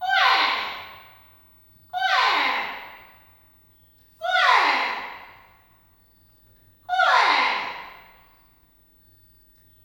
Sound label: BIRDCALL, Heart of Africa; Spectrasonics; BIRDMEN